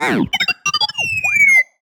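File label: Sci-Fi Droid Robot Emote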